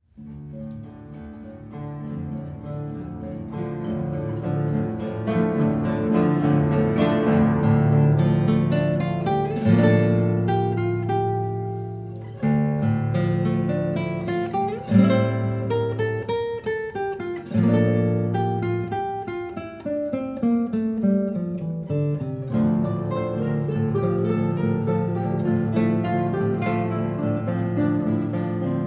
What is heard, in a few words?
guitar brigade